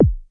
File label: stomp bass, Roland SH09, drum machines